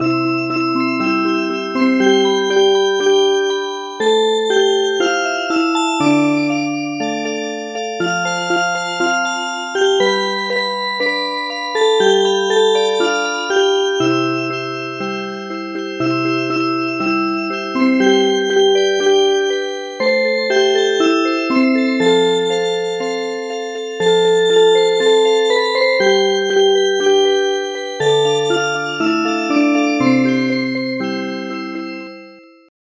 Music Box

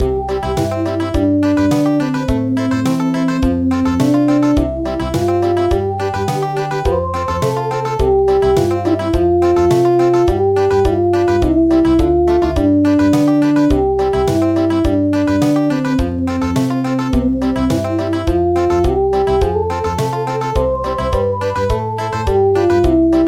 Country

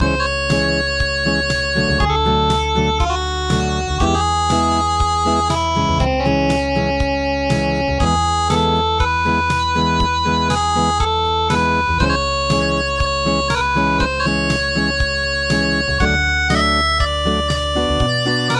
Acoustic

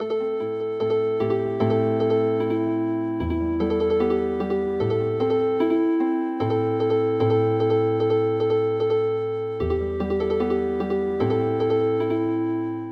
tsuroyo Healing Harp; tsuroya

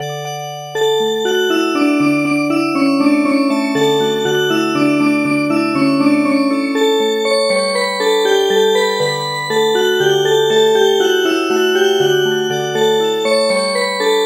Music Box

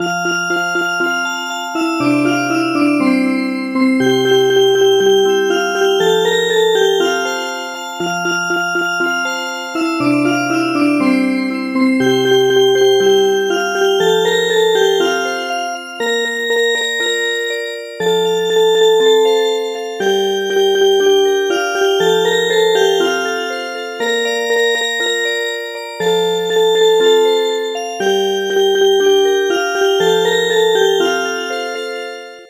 Music Box; Chan Mali Chan

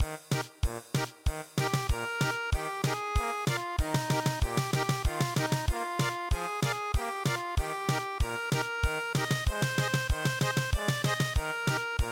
German Polka

